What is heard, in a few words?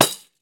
PANDEIRO